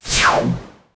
concise modern ui whoosh

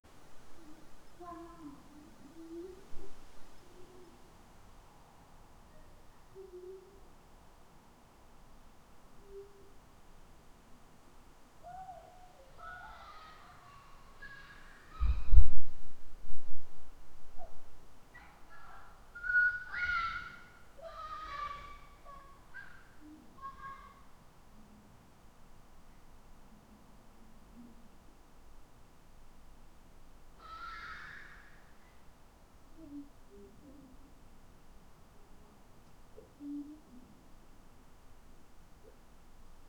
the echo of childrens lau; human emotions

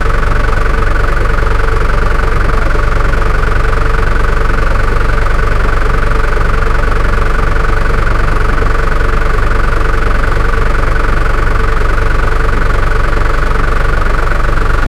the sound of the tiger, tanks

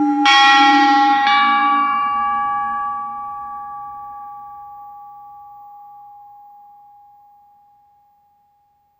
make an internal pa syste, bell

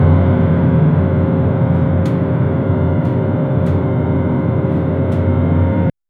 neutral ambient, banging

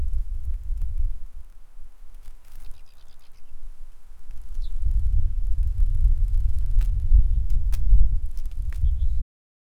snow crunch under feet

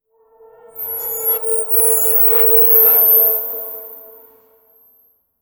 futuristic sci fi ufo so, cyberpunk

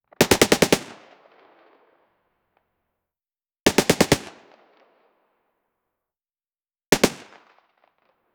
realistic combat pistol g; gunshot

